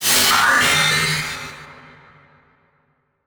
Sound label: Title Transition Crystal Shatter; Production Element Title Transition